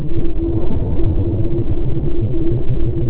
industri underwater

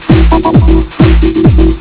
tekkno

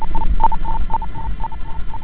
sonar

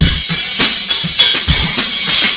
jazz dirt

